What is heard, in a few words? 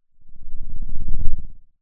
Sound of a lion’s roar